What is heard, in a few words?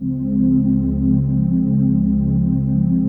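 trance pad; Best Service; XX Large - Pads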